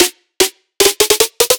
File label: 150BPM Short Snare Roll; Antidote Advent; Infected Track Drums Ableton Project